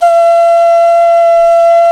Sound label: Clay Flute